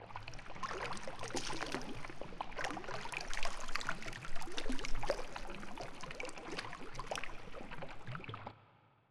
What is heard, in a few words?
lake, ambient; Fate The Traitor Soul